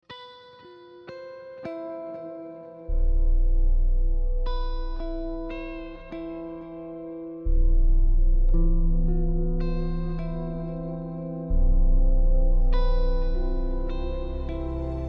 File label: Inner World Transitions orchestral